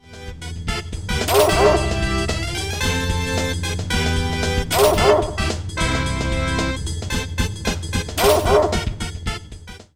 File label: chomp us